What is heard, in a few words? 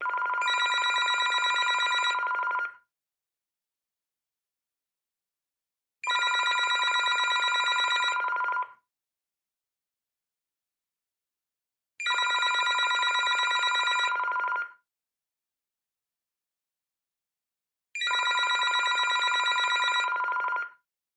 ringing